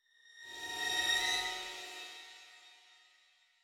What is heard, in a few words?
Action Transition, Classic Horror Sci-Fi Music; Dead Ark